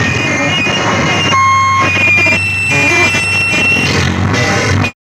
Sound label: FEDBAK